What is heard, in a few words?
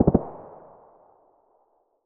hk416 burst short; weapons; SPT Battle Ambience